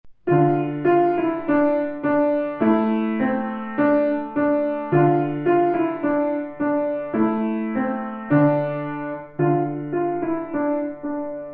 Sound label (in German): Tanz Flandern, Klavier